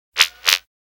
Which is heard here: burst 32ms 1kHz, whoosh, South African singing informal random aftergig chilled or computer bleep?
computer bleep